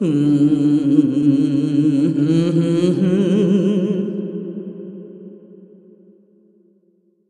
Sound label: Singing; Impa's Singing; Legend of Zelda Skyward Sword